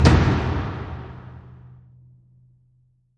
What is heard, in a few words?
drum hit